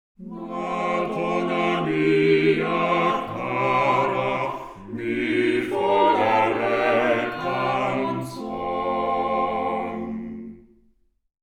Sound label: quartet